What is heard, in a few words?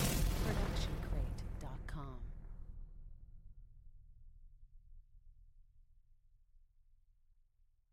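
Sci-Fi Electronic Explosion Wave; SoundsCrate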